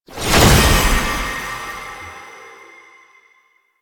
Companion Resurrect; Warframe; Tenno

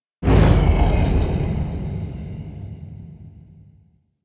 Railjack Blackhole Ability Tail; Warframe; DE; Vehicles; RailJackPowers

